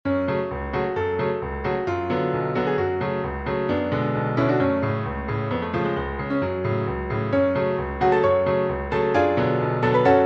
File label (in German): Ungarischer Tanz Nr. 5 fis-moll